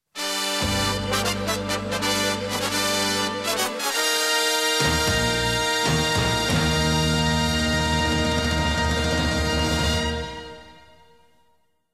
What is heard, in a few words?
fanfare